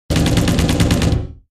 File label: rifle